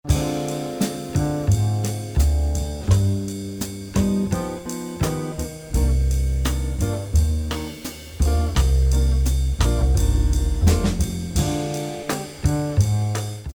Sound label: jam track, rhythm guitar; rhythm guitar mastery